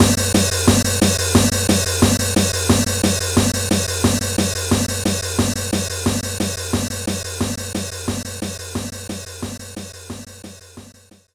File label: Fill; Sly Cooper and the Thievius Raccoonus 2010 PS3; Mugroof Fill